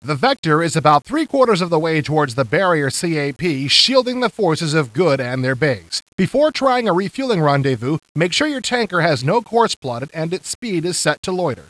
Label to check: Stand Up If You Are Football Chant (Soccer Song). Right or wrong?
Wrong